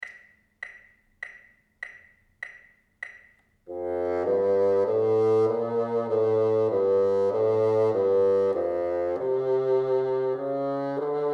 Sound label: teacher, bassoon